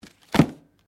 Book Drop; Book movement; Books; Offices; SoundEffects-Foundation1

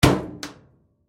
Dryer Door Close Latch Click; Appliance; Household; Appliances; Blastwave

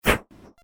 Intense And Quick Airy Whoosh; Andrew Fleming

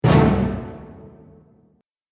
Shock Cinematic Impact; Miscellaneous Impact Sound Effects

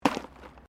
JUNK, Debris

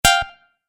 Tap Error, tapping

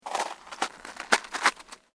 SNOW CREAK

